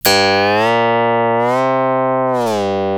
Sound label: Synthesized Guqin Tone Without Body